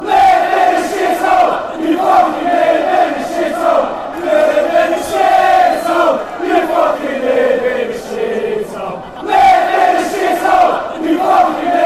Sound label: live hole, fanchants